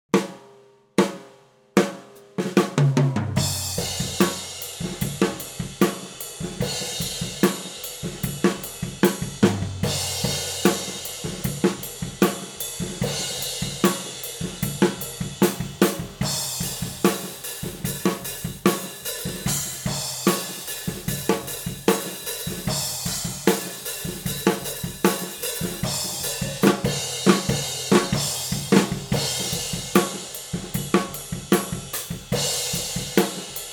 overheads, mic samples; ADX51

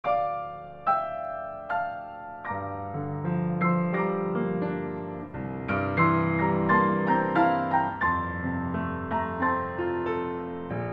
Gemini Room; Microphones Tested

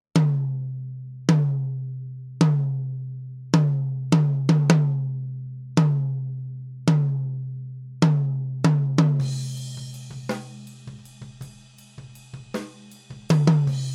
Tom Microphone 58

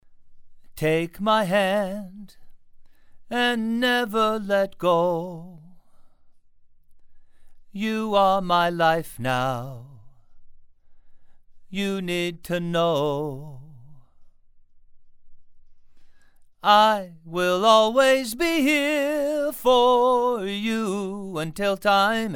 Male Vocals

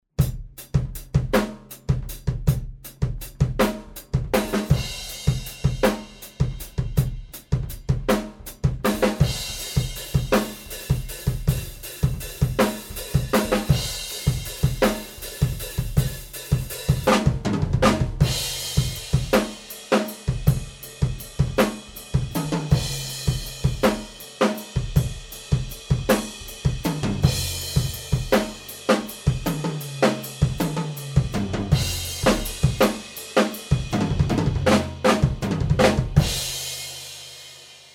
Room Mics U67

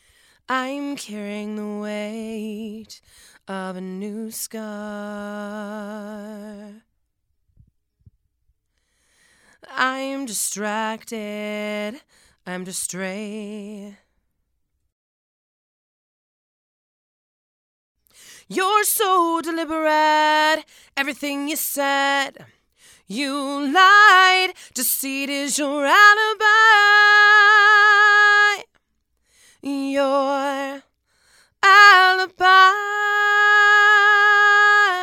Female Vocals

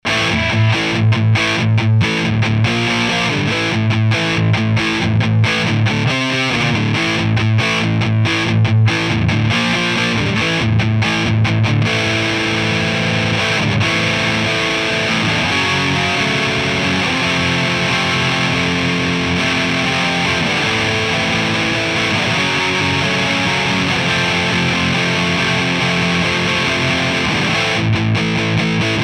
AMP DIst

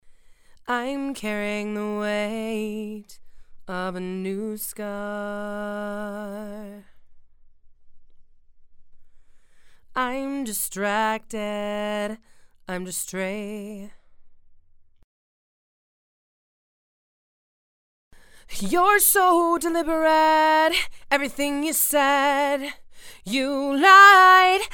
Female Vocals; Gemini 5 Tube

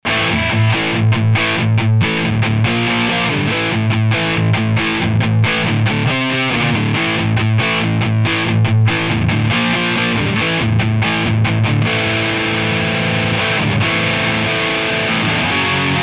AMP DIst

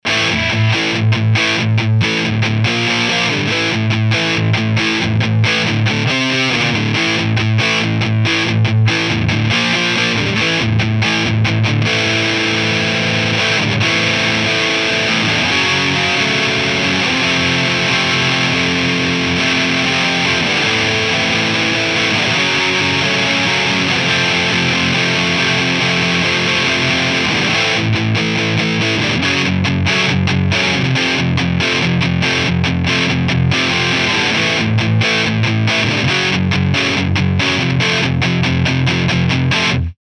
AMP DIst

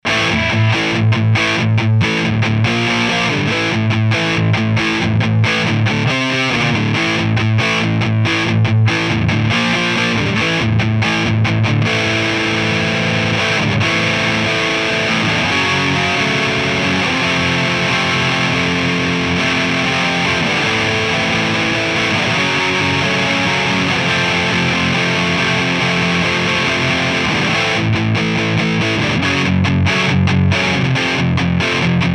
AMP DIst

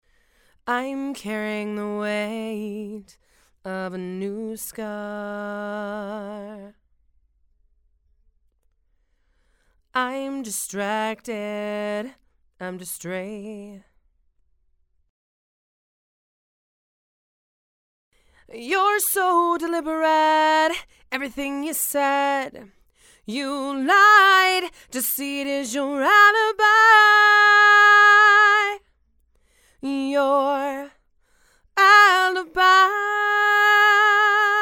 Female Vocals

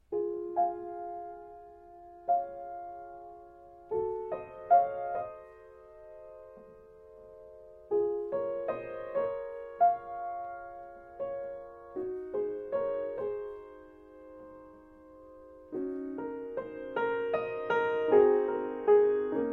Piano RN17

